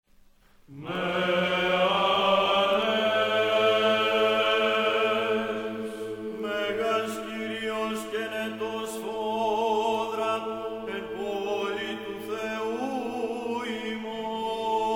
Greek, Service of the transfiguration of our saviour